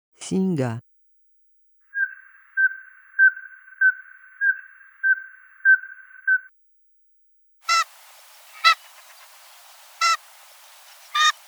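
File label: black scoter voice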